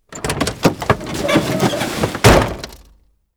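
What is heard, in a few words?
npc door open; favela; merc; Call of Duty Modern Warfare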